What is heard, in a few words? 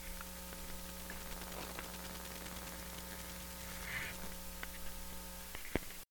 Router